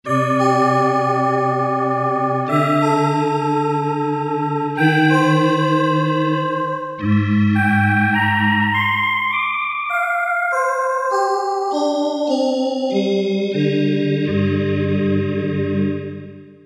Space Voice, Roland SC-50 Sound Canvas; Factory sounds

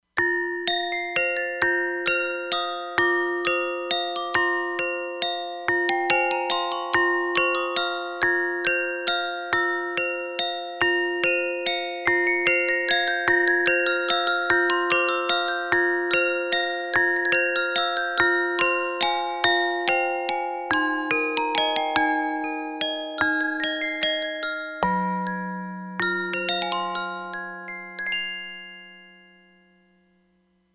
Glockenspiel, E-mu Proteus-2; Factory Presets

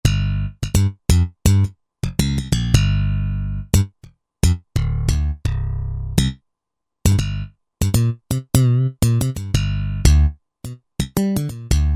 SlapBs; Roland SR-JV80-09 Session

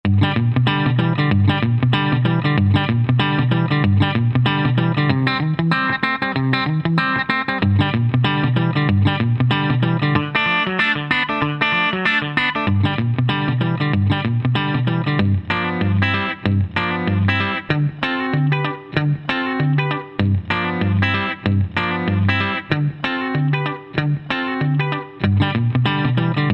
Guitar Phrs, Country; Roland SR-JV80-17 Country Collection

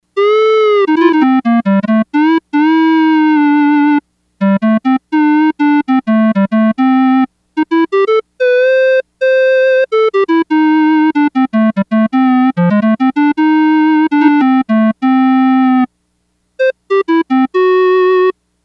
Claranette, Yamaha DX100; Factory Patches

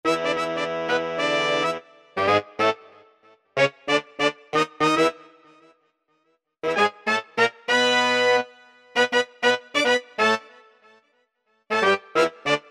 HORNS Live Pop Horns; Apple GarageBand 2

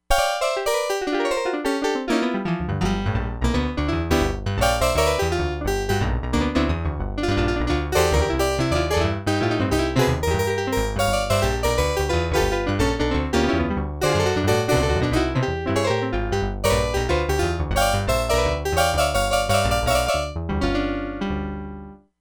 BRIGHT PIANO, Crumar Bit 99; Factory Programs

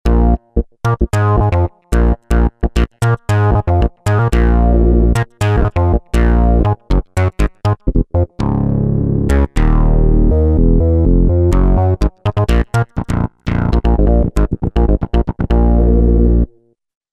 Wonder Bass; Factory Patches

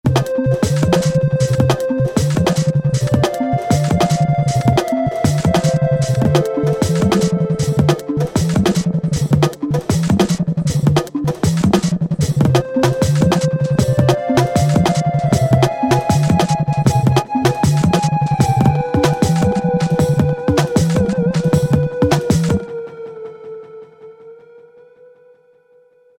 Alesis Z2 EuroDance; Darshan

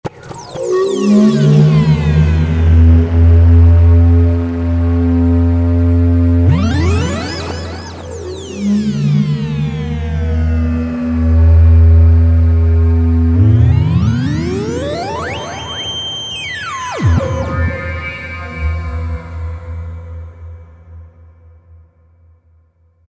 Superfoghorn, Novation XioSynth 25

